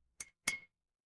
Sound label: glass clink